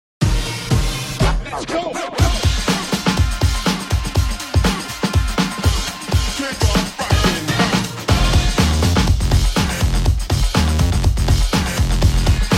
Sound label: Funk Breaks Music